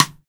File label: CStick, Rimshots, Roland BK-5